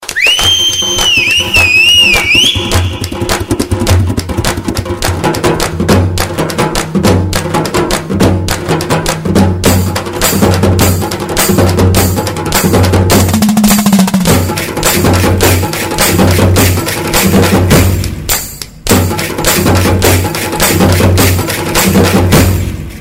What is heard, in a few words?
Party Rhythm